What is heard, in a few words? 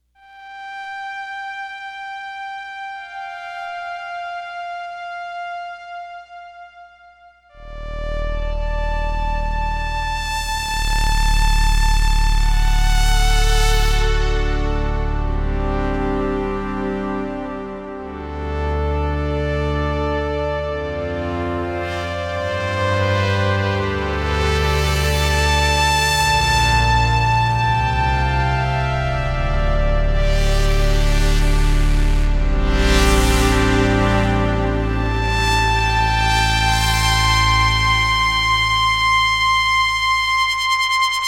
cs80 sound